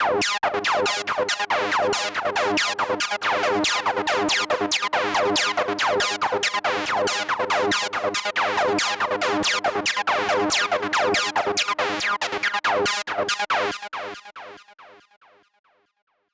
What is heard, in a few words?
Sol-7 G#; Vengeance Samples